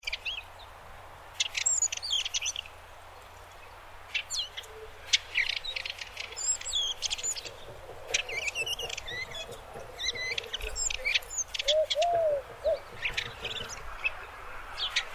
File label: tristrams warbler